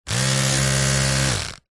household electric toothbrush on off short burst close up; zapsplat